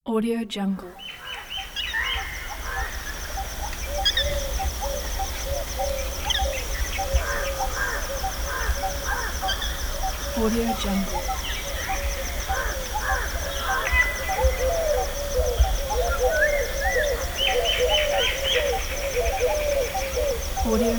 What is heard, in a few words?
birds nature